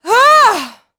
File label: attack voice; UFC Tapout; XBOX